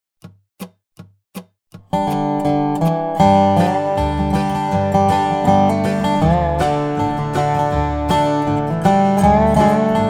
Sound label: Key of G Dobro and backing track